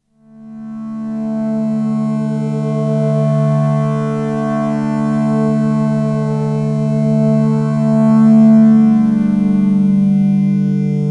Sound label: matrixbrute2 soft pad